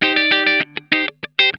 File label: SLICE, Funk Guitar; Zero G - Funk Guitar